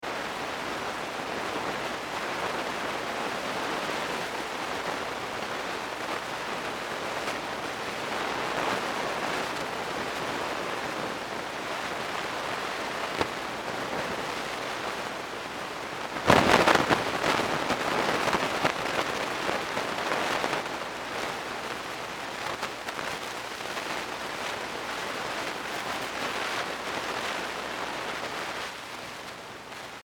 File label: Static tube amp ca1960s, Static, Tube Amp; tube pa amplifier static, constant, some bursts of noise; Electronics